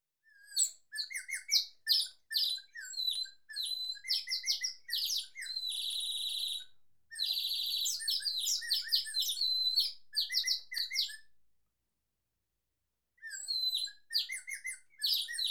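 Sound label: toy whistle, nightingale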